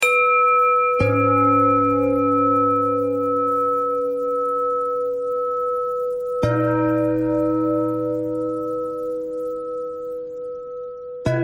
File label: bell thread bend thread; bells